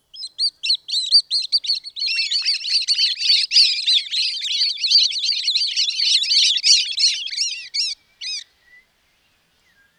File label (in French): martinet a collier blanc